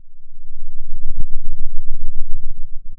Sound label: pop, Sound of a thunderstorm